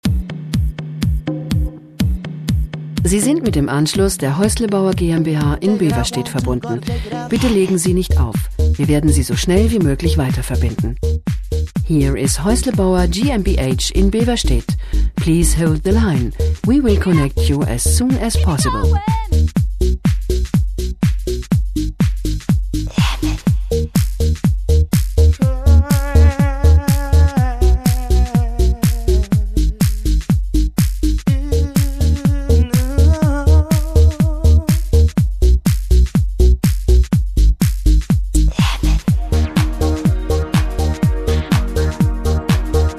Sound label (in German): Wartemusik; Haeuslebauer